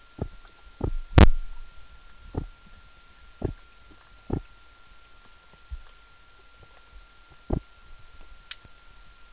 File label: barking, fish; tivoli bay